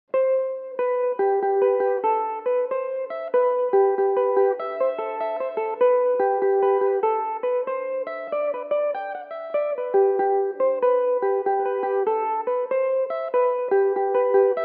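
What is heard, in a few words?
G major belmuse, irish mandolin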